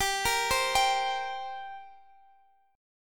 G Minor Double Flat 5th, G, Guitar, Open D

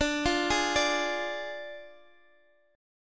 D Diminished Guitar, chord; arpeggiated; 21,20,20,19,x,x; 4F,4A,5C,5G,5C,6E

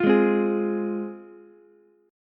F Minor F down Ukulele; strummed; JGuitar

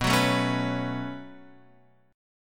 B 7th Suspended 2nd, Guitar, Open F; B7sus2; strummed; JGuitar

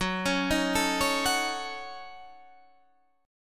Gb Minor 6th Gb Guitar Drop D

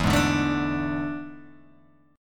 E 11th, Guitar, Open G

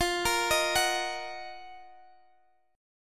Eb Minor F; Ebm/F arpeggiated

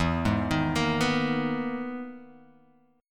E-Major Sharp 11th E, Guitar Open G

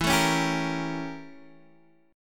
A 7th Flat 9th E

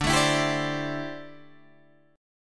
D 9th Suspended 4th D down Guitar Open D

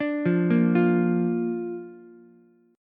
Bb Major Bb down Ukulele, chord; arpeggiated; JGuitar

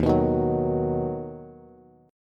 Eb Minor Sharp 5th Bass; Ebm#5 strummed; JGuitar

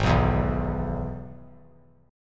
Gsharp Minor Sharp 5th; strummed; 8,6,7,9; 3C,3F,3A,3D

